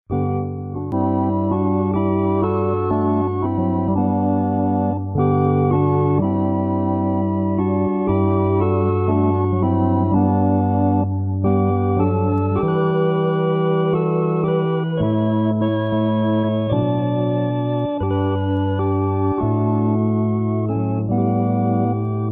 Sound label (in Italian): solo musica